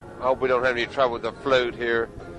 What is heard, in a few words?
flute